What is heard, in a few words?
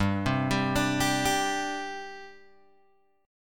G Suspended 4th, Guitar, Open G